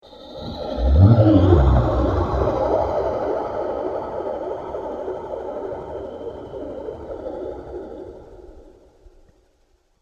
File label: Space Beast, science fiction sound effect; star wars / trek style games